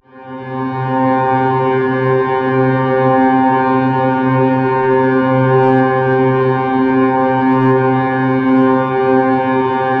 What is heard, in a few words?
Guitar Pad C low; ESW Sphere